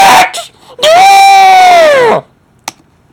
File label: drrrrr